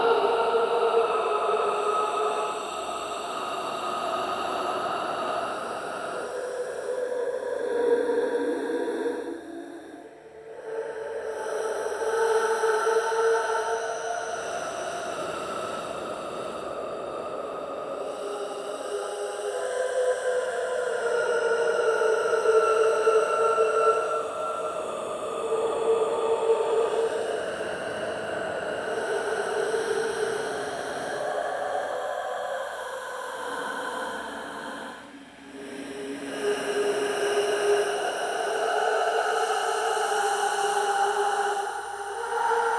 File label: Female banshee screams; Phasmophobia